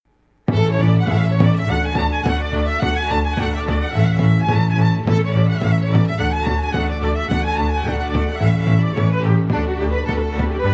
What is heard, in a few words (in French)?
Ardelean Batrinesc, Roumanie